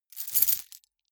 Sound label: key ring large rattle air; objekte; schluessel